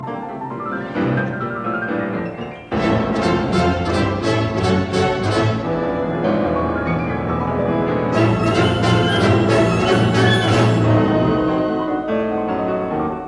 Piano Concerto Pezo giocoso Vivacemente ma senza fretta; Op 39